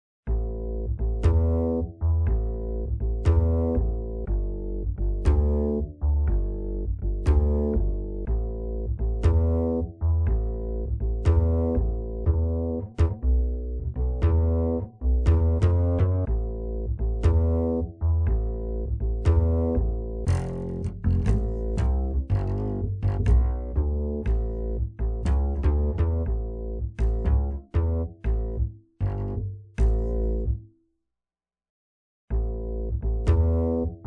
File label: Upright Bass, Bossa Nova; Surround Session